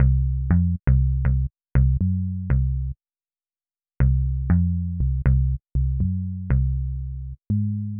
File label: Raw Bass